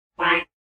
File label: glitter quack